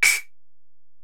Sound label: EPerc, Alesis DM-PRO; 300 Drum Machines